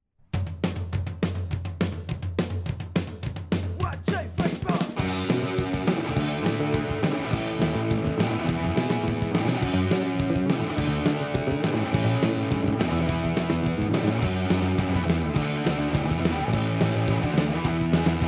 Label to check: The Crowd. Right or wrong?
Right